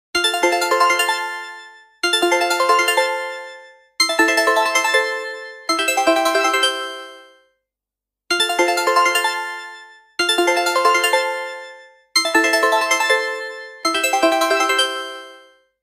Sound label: Nokia original remix